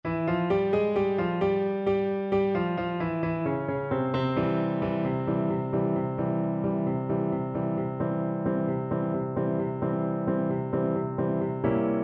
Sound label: a ya zain FL kar, Flute